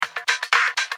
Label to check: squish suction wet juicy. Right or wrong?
Wrong